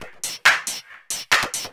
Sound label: percussion loop 138, techno; PS-45